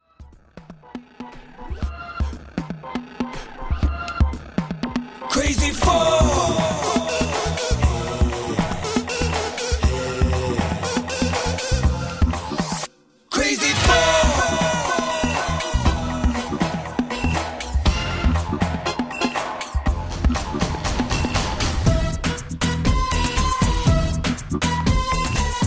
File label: remix; Krazzy 4; Bollywood